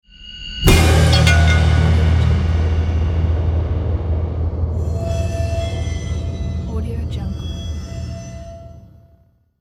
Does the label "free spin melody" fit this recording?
No